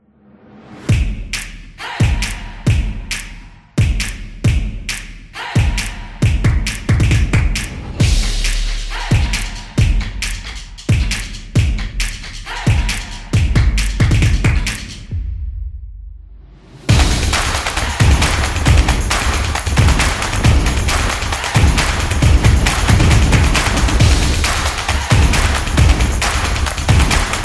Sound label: Drumming Music; soccer drums